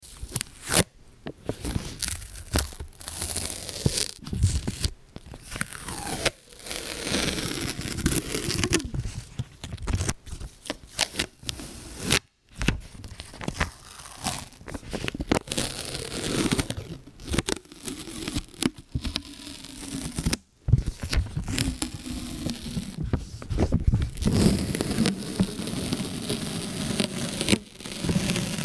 packing tape

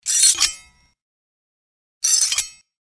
draw sword

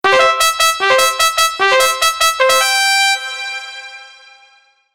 jingle trumpet

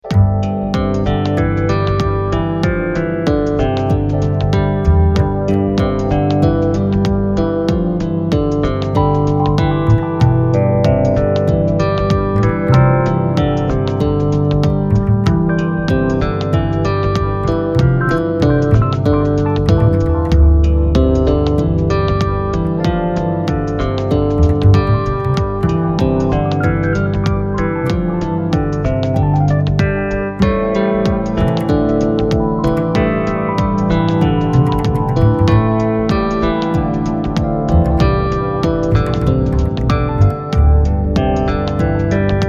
bass music

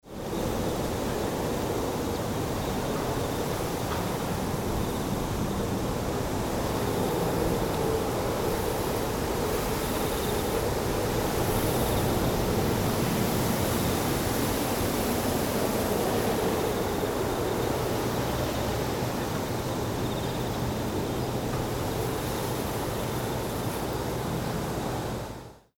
rice field

